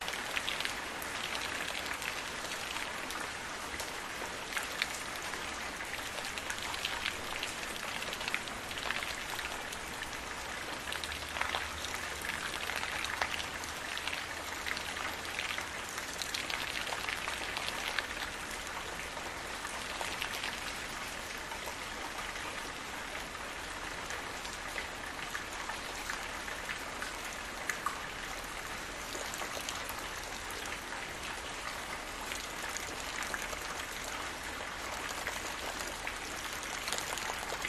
water drops splashing in puddle gentle rain in the background; background city; Sound Ex Machina